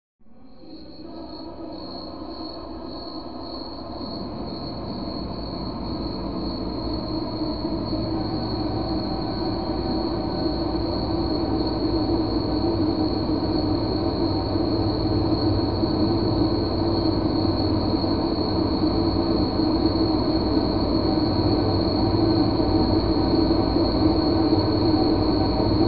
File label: Crickets; Dobby